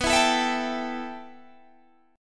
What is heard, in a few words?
B 7th Suspended 4th Sharp 5th, Guitar, Open D